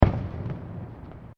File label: Fireworks Single Explosion; Fireworks (Sound Effects:Backgrounds)